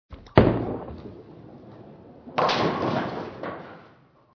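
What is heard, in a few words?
Bowling ball strike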